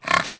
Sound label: boar sniff; The Elder Scrolls III Morrowind GOTY; XBOX